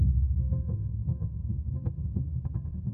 android notification

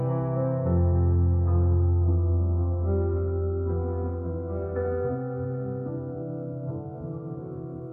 sad piano; sound effects

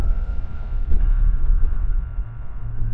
door creak

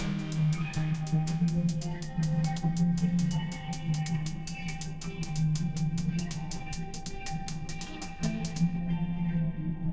tension music; sound effects

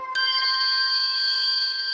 camera shutter

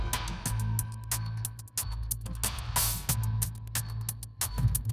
drum roll; sound effects

